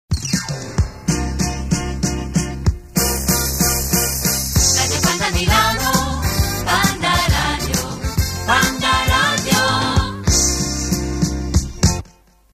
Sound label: jingle radio; panda